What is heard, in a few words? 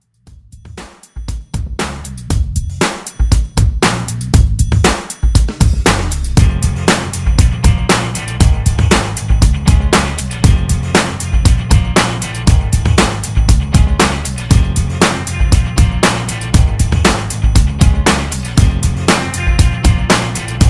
Rock To House